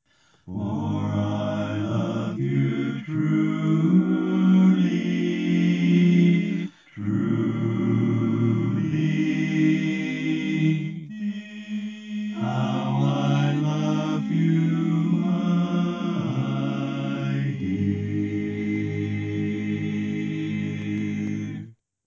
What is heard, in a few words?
Bass, barbershop tag